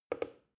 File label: plastic button start click soft fast